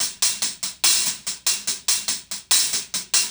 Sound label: HH, Closed Hats